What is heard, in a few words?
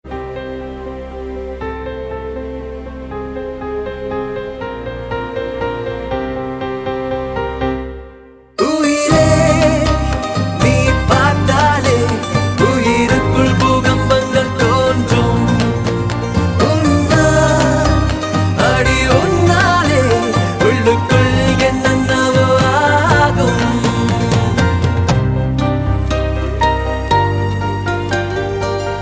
sms best bit shiva ringtone; Navratri Special